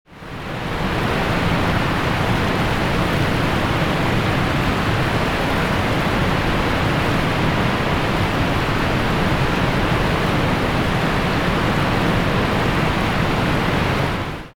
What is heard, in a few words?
Big Waterfall, Ambience; ILIFE STOCK MUSIC AND SOUND EFFECTS